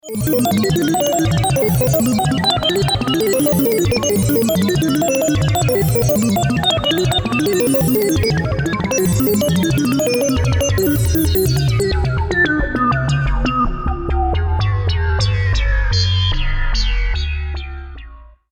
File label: robot shut down